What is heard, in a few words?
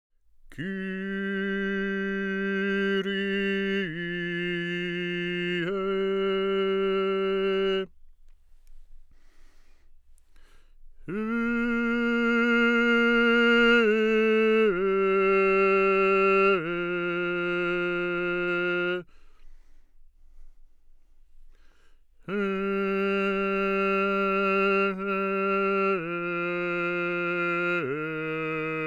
tenor